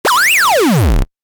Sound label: Retro SFX, Retro Style, Harsh, Heavy; Tech (Sound Effects:Science Fiction)